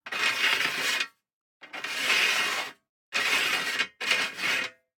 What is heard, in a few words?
DICE on Metal, Slide, Standard, Three Dice; Samples; ArticulatedSounds; DICE Sfx Party (Extended)